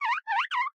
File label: wake up; stray; Zurg